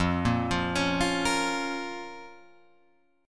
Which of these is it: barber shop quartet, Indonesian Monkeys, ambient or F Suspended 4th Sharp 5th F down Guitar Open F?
F Suspended 4th Sharp 5th F down Guitar Open F